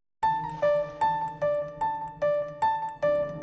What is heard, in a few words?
scene piano intro